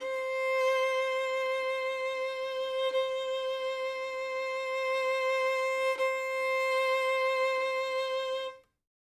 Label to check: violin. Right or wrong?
Right